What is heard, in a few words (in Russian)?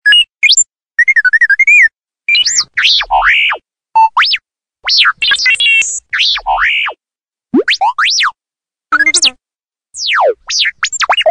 zvuki r2d2